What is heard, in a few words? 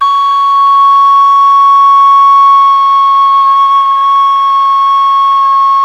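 khlui C#5, Thai flute; Spectrasonics; Heart of Asia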